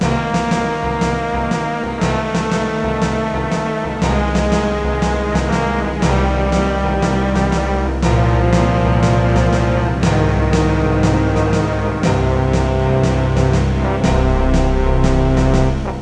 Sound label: loop; responsible bartending; Cocktail CD